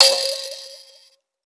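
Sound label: VIB KALIMBA D#5; Heart of Africa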